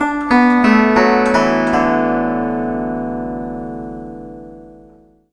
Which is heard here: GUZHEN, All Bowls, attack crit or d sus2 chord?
GUZHEN